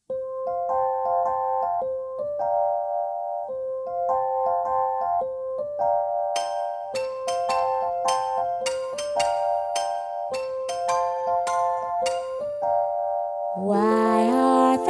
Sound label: Audition VOCAL TRACK key F